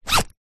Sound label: sleeping bag zipper; zapsplat; foley